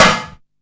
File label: Snare, Drum & Bass